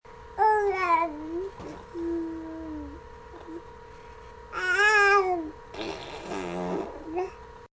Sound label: mumble raspberry